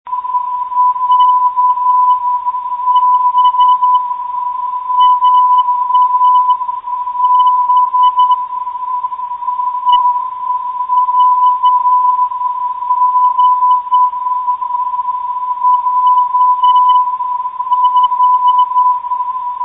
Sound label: 316 kHz, ndb